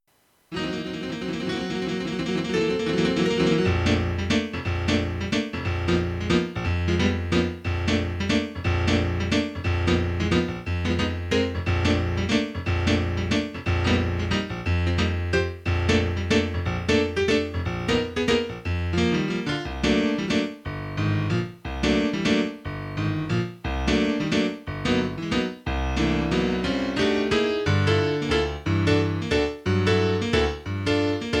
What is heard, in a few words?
accomp only